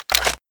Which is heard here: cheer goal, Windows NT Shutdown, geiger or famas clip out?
famas clip out